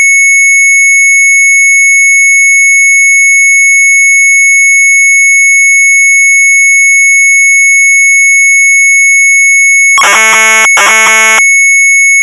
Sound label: Sharp MZ800 Tape